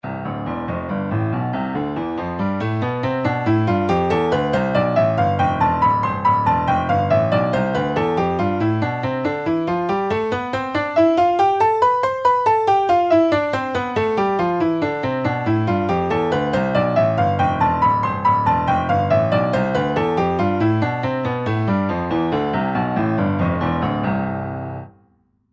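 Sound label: C major contrary motion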